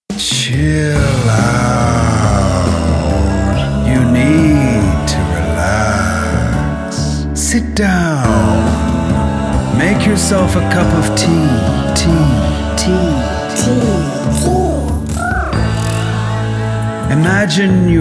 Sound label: Chill Out; Dreams